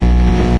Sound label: science asterisk; windows 9x; sound schemes